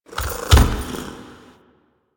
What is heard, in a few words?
Jump Up; Arsenal Lair; Warframe; Kalymos